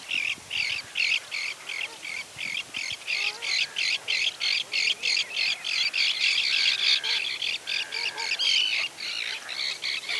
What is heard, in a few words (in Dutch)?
visdief bedelroep